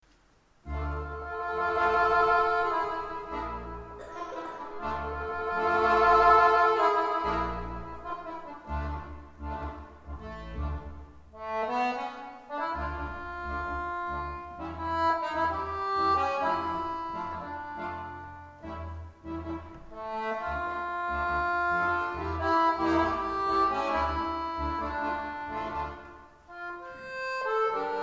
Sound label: Tango